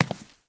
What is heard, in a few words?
Step Wood